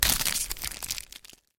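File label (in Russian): звук открывания шуршащего фантика конфеты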